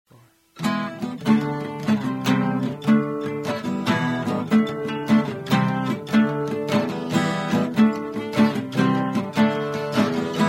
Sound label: Instrumental